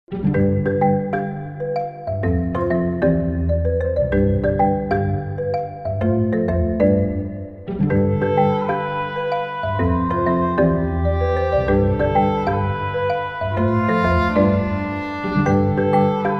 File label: Beautiful Nature Hard working ants